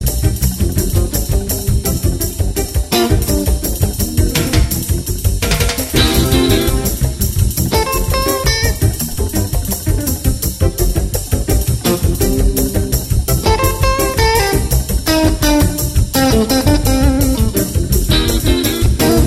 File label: up and down; 50er